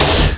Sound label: canon; Xenos F4 1.0